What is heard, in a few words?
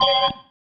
Stab, Organ, One Shots